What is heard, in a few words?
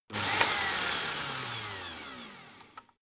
electric whisk rev rear; haus-garten; kueche